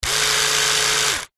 household razor electric remington male trimmer operate run dry short burst